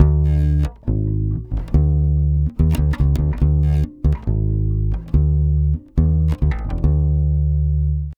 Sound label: 140 DDDIM, Brazil Bossa; USB Soundscan; AKAI